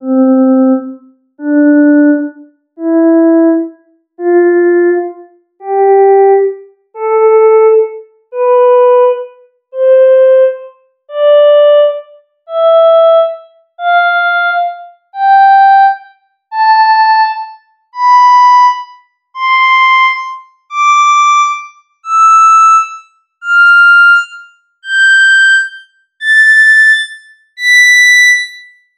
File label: flute